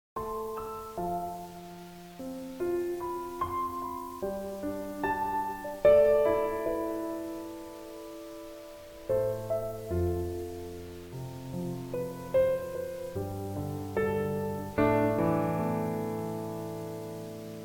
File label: Log In; battlefield of electro girl; android